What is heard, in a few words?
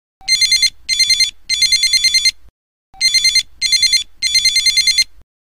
microtac 9800x, telephona motorola